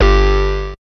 ERASER; E-MU Producer Series Vol. 2; More Studio Essentials; Composer; Technopourri

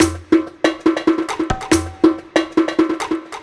Addon Loop, Drum Loops 140bpm, Perc Loops

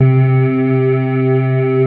REED C2, D50; E-MU Producer Series Vol. 2 – More Studio Essentials; Keyboards; D50 Combo

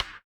BUZZER; Spectrasonics; Metamorphosis

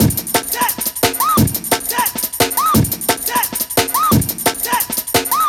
175, classic dnb breaks

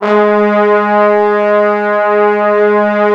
G#2, Orchestral Brass; Best Service ProSamples vol.20 - Orchestral Brass; AKAI

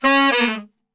C#2 SAX SHF, Sax; Giga Samples Collection

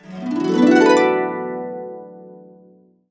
D, Harps; USB Soundscan vol.62; AKAI

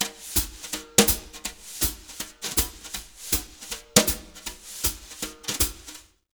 Jazz Latin Drumloops; 160 CHABAD; USB Soundscan vol.08; AKAI

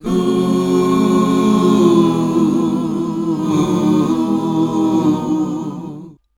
Voice Spectral, H PHRS; Best Service; Voice Spectral II

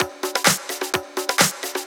Latin Loop 128 BPM

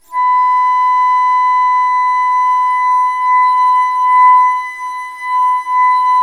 ALTO B4, ALTO FLUTE; Historical Instruments; AKAI; USB Soundscan vol.23

